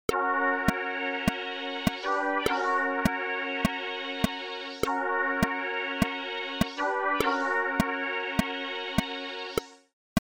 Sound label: Pad